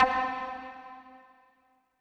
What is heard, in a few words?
Synth C, Synth Prophet One Shots; Electronisounds